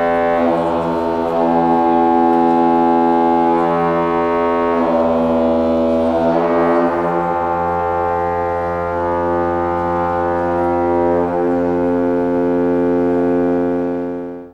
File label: pipe organ fx